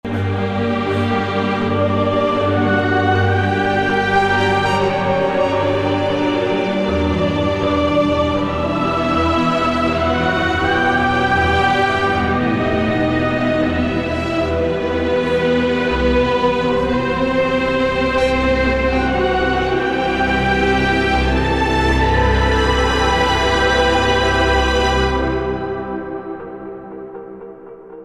Magic, 80BPM; mixology vol 1

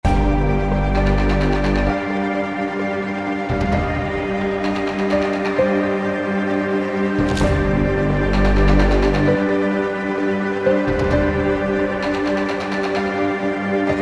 Epic 130BPM; mixology vol 1

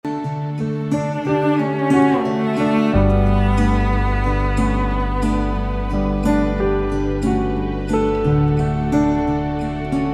Romantic 90BPM; mixology vol 1

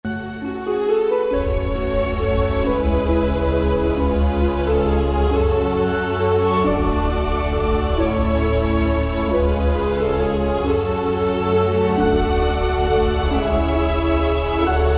Magic, 133BPM; mixology vol 1

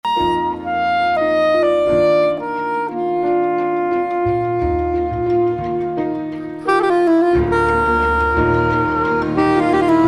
Romantic, 88BPM; mixology vol 1

